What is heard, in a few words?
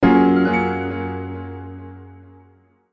Ringtone